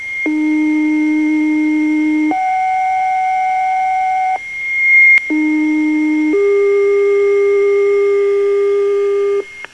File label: 88 tones